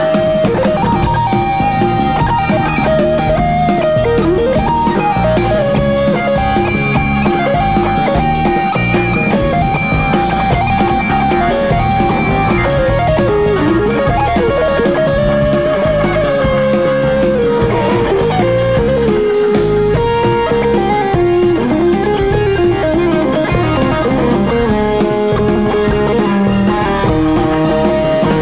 bar music; Duke Nukem; Epic

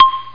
Xylophone high c; Troubled Souls

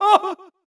yells

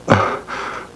pain uhm; People; Sound Library 2000; Wayzata Technology

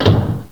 door slam; GMPlay; Drums; XGSfx

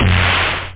snare; da capo vol1; drums

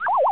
blip

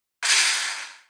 waste

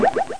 drip echo; electronic sounds; Sound Effects for Multimedia - Volume 1

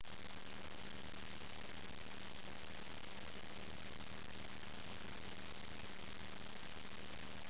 silence

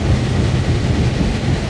train; vehicles

